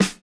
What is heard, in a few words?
snare, snare house